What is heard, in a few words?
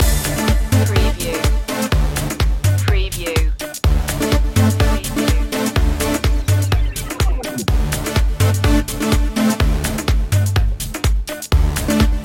bass loop